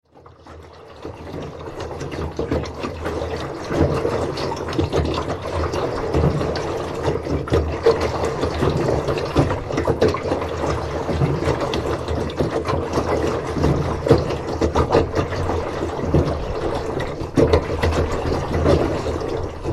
row boat in tam coc

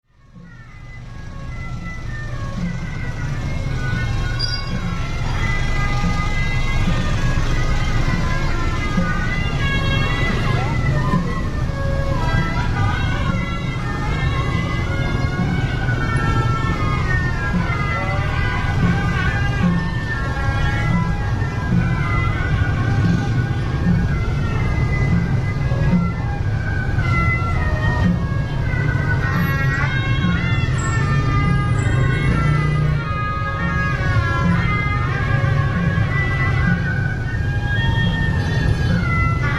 street procession

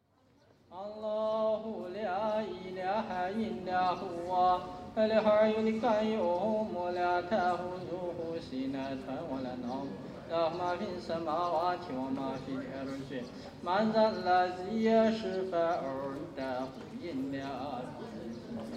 prayer time in xian mosque

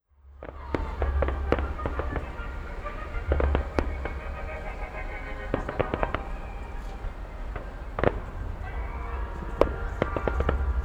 fireworks

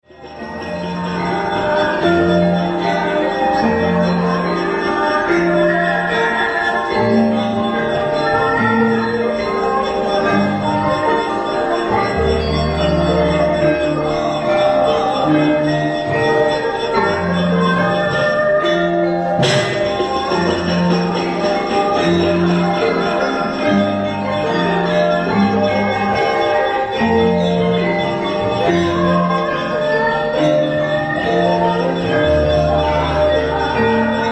puppet show gamelan

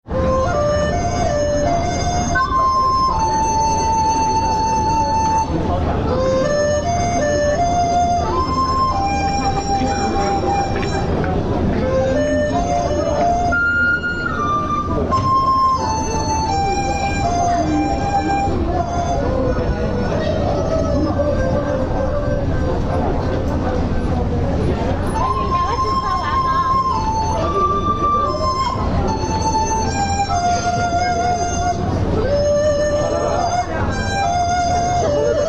selling flutes

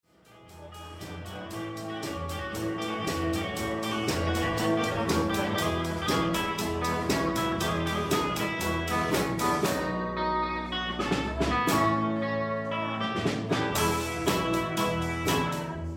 bluegrass night camping band

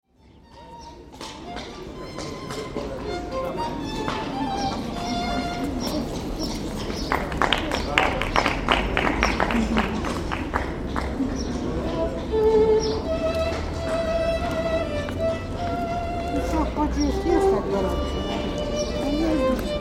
busker; warsaw

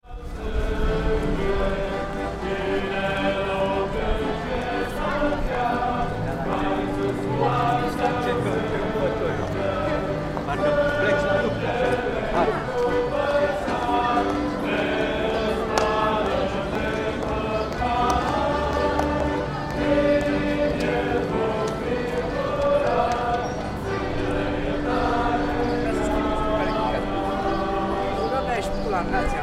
romanian hymn

